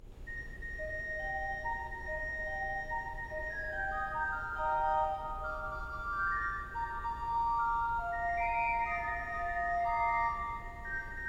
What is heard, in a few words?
Flute